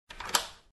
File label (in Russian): zvuk dvernogo zamka